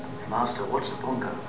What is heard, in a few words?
bongo